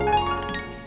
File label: correct